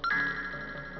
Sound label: frog coin